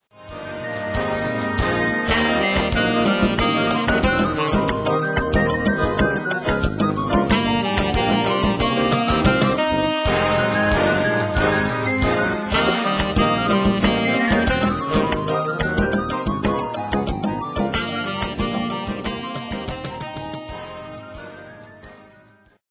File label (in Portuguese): tons do samba